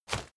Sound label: step leaves wet sprint single; schritte